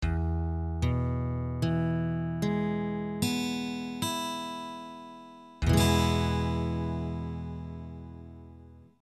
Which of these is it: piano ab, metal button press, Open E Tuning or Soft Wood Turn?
Open E Tuning